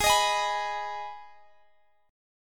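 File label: Ab Minor chord, Guitar, Half Step Down tuning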